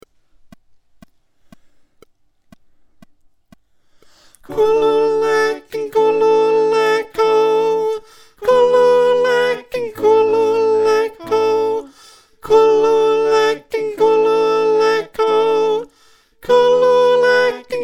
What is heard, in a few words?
Backing Chant Sop